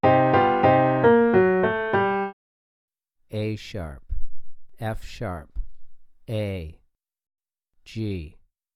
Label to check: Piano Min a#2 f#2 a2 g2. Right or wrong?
Right